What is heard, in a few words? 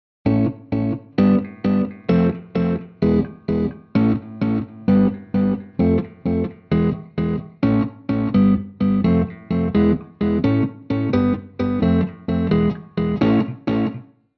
delay